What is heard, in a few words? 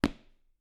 Step on wood